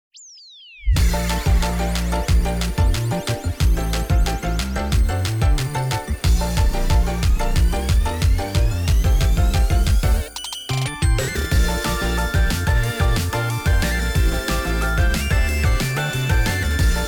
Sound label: inst